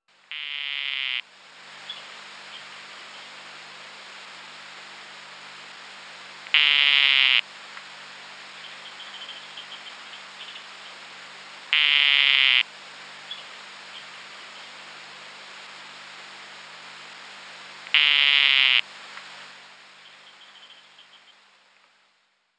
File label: Dendrobates tinctorius